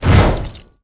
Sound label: DROP